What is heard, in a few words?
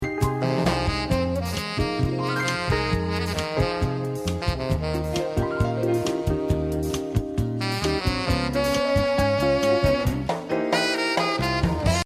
Jazz Saxophonist; Albuquerque